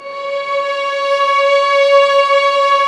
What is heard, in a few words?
STR VLS, Roland String Master Series, Vlns 6 p-mf